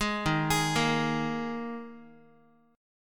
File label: Eb Suspended 4th Eb Guitar 4A 4E 5A 5D 5G sharp 6C sharp; Ebsus4; arpeggiated